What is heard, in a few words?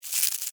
Insect Move Bug, Movement; Animal; Insect Movement (Sound Effects:Animals:Insec